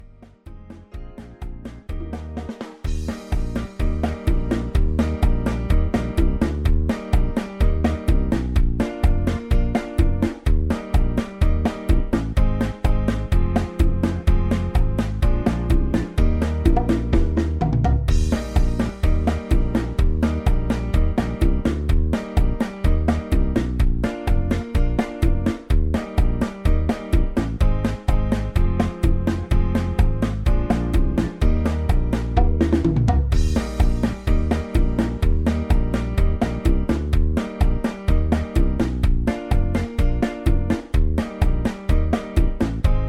No Sax Patter

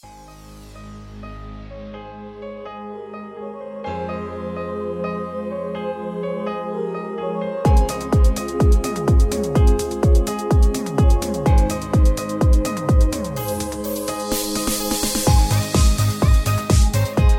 Instrumental with Melody

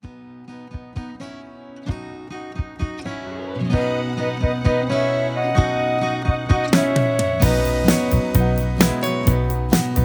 No Leads

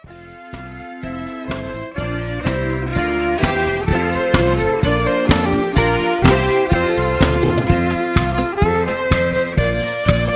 High Key

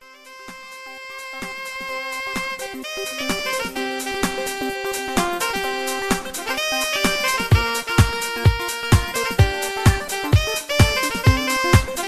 Instrumental

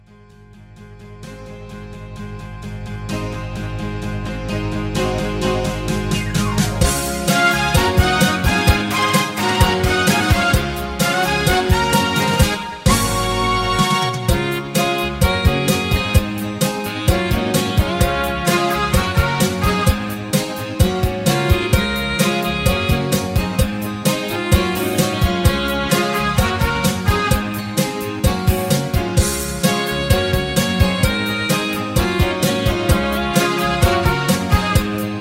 Hard Rock High Key With BGVs

